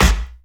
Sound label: Claps & Snares